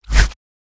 color window; build mode

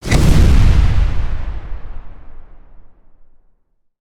Fire Burst One shot

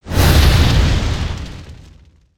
Spinjitsu Fire Swing

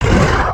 idle, creatures organic

